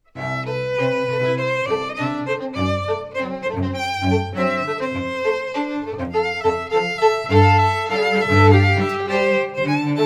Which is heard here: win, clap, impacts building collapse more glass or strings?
strings